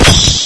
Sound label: ag fire; agrunt; video games; source engine; bshift